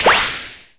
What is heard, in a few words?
SYS CURSOR BUTTON; TAB; maimai